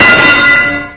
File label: gong